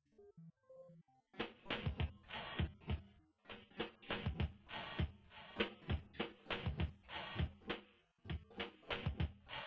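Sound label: with drums instantaneous source; SiSEC10; underdetermined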